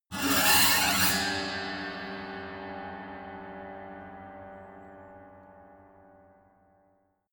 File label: piano bow string broken high